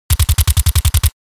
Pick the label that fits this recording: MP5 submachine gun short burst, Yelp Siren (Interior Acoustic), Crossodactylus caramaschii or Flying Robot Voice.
MP5 submachine gun short burst